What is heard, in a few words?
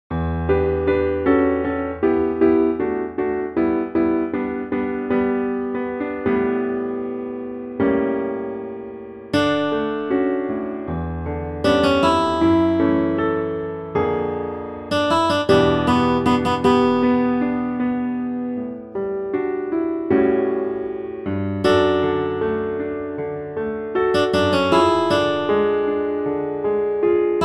accomp melody